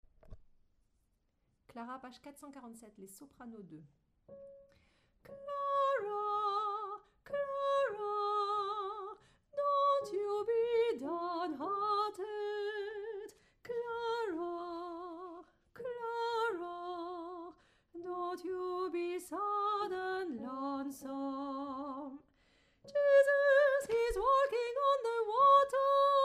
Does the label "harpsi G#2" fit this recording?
No